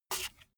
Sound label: bmx bike tire foot scuff long